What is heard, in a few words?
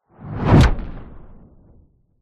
sound design shockwave whoosh into metal slam